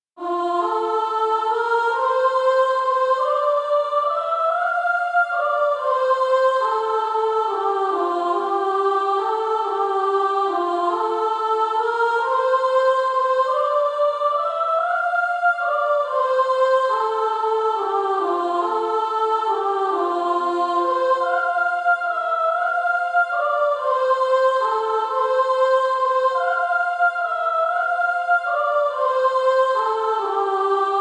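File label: Female Choir, soprano 1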